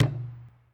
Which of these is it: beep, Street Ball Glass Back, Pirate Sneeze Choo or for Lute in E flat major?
Street Ball Glass Back